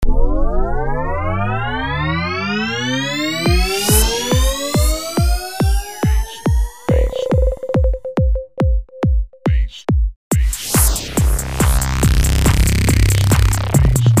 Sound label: Bass